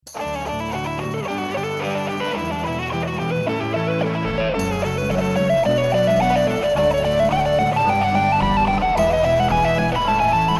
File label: melodie